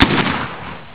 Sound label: colt45, weapons